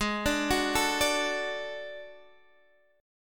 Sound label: D Major Ab, guitar; D/Ab arpeggiated; JGuitar